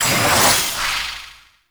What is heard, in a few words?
Transmute Ability Cast; Warframe; Warframes; Alchemist